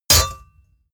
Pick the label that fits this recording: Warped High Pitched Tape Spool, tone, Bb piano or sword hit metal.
sword hit metal